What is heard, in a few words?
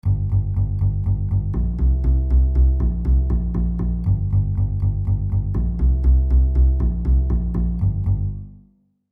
bass lick; instrumentos; baixo; licks para baixo